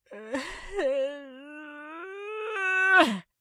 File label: groan